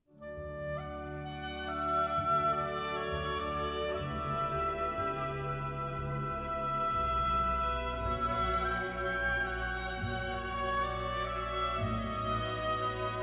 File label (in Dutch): Hoort gij die stemme, mannenkoor